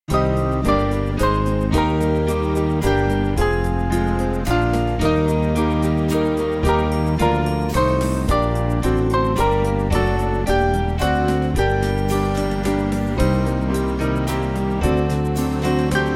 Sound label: German Band; Small Band; God Is Ascended